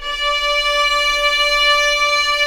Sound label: STR VIO; AKAI S-Series CD-ROM Sound Library VOL-2